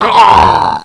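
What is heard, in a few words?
die, alien controller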